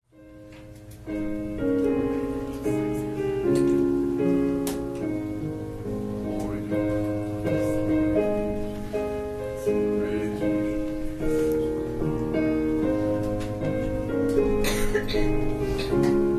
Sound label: Piano prelude